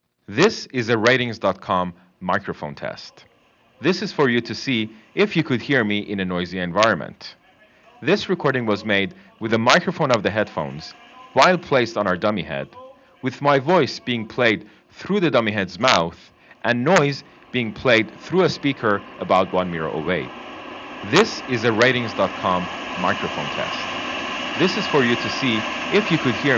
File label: bt subway, headphones; Razer BlackShark V3 Pro; gaming; aviation-inspired